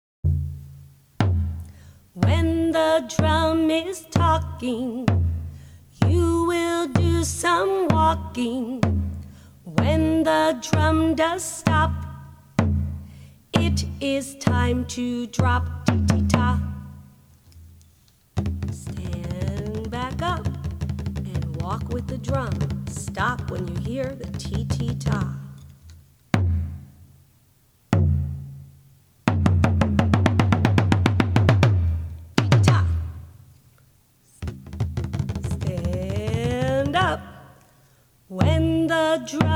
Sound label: Walking Drum